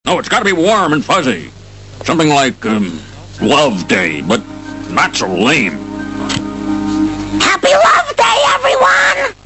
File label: trash